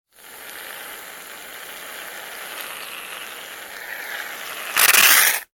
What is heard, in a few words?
household shaving foam spray; Aerosol Shaving Foam Spraying / Squirting From Can; Bathroom (Sound Effects:Household); McKinney Sound